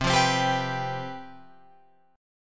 C Major 7th Suspended 4th C down Guitar Open F; strummed; CM7sus4

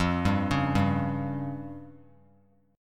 F Suspended 2nd Sharp 5th, Bass Standard; Fsus2#5 arpeggiated; JGuitar

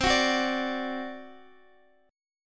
C Suspended 2nd Flat 5th C down Guitar Open D

